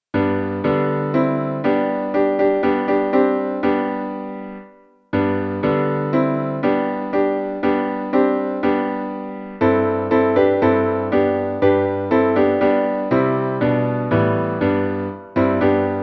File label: Gospel piano; Down to the River to Pray